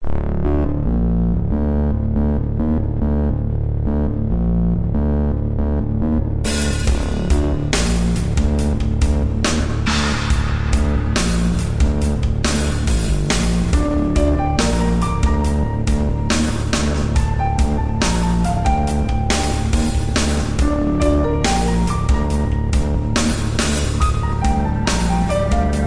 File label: theatre music